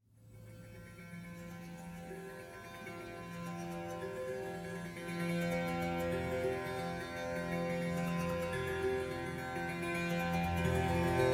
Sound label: monochord therapy sequence, fm, 135; instruments; loops